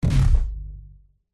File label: sound design impact designed glitchy thud; zapsplat